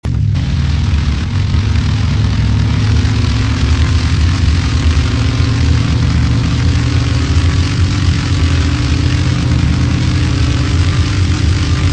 third, vehicles cars; lwcars

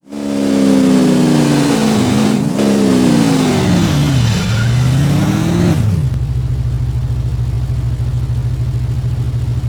throttle off fast, hummer h1; lwcars

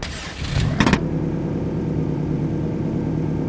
start up, subaru BRZ; lwcars

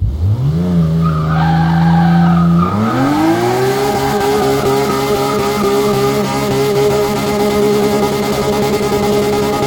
rev, vehicles cars; lwcars; tvr sagaris

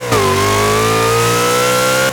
second, charger 70; dod charger 70; vehicles cars; lwcars

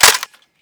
bolt release, weapons; cw m249

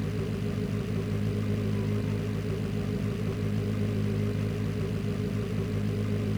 idle, vehicles cars; lwcars; ford rs200

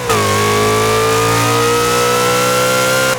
third, vehicles cars; lwcars

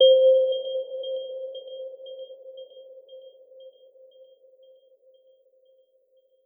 bell

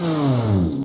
slowdown slow, vehicles cars; ren alpine zar; lwcars

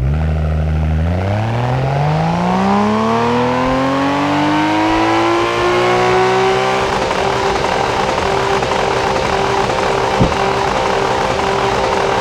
rev, vehicles cars; lwcars; niss skyline r32

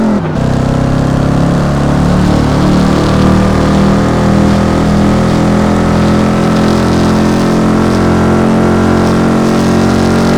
third, vehicles cars; lwcars

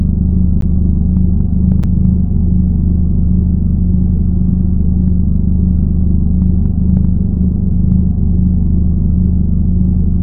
idle, vehicles cars; lwcars

